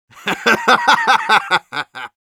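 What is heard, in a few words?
laugh happy, Russian; Medic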